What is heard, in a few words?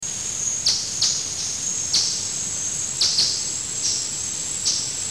The sounds of birds